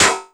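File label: snare drum, Drum & Bass, 160 BPM; Ueberschall; Drum & Bass Flash Trackz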